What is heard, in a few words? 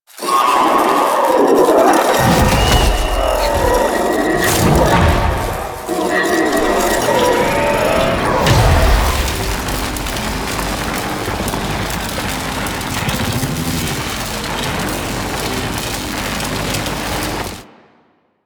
Attack; The New War; Lua Valley Ext; Aerolyst